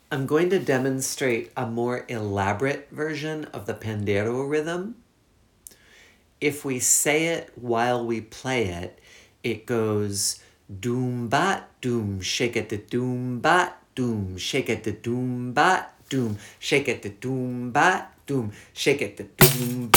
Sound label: Pandeiro elaborate demonstration; Formada Tremor Mortal’s Capoeira Music Practice Material; pandeiro rhythm